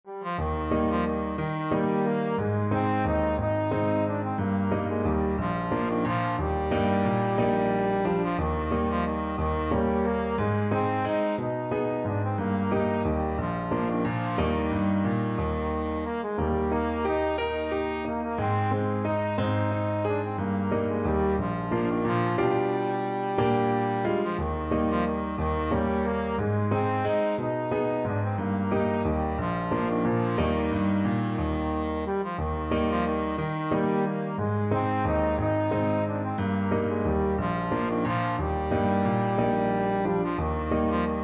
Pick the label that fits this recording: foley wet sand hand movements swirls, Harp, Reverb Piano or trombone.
trombone